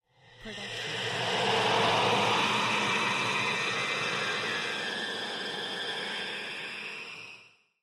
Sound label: Screamy Screetchy; soundscrate